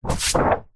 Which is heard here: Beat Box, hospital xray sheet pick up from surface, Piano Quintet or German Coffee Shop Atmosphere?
hospital xray sheet pick up from surface